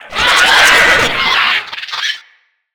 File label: Moa Enemy Spotted, Vocals